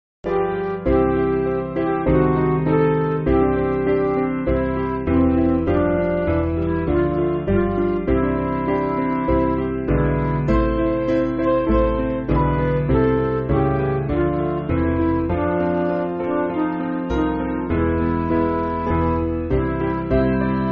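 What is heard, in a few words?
Midi, Music Backing Files for Churches; Beneath The Cross; St Christopher; Beneath the cross of Jesus I fain would take my stand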